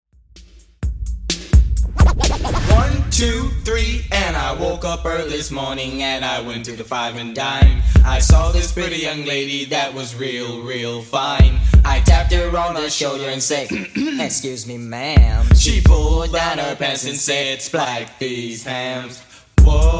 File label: 80s DJ Friendly 128bpm